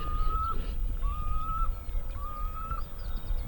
cheveche d athena

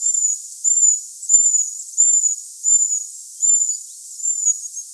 grimpereau des bois

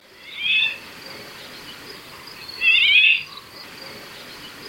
loriot d'Europe

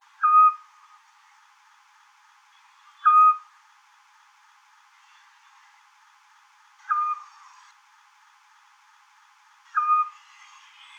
petit duc scops

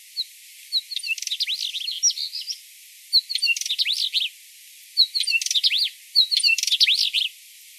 traquet motteux

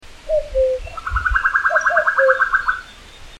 coucou gris

